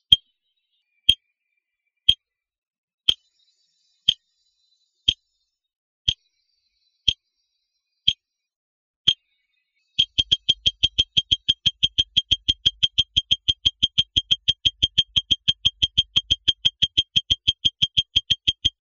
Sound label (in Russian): Евро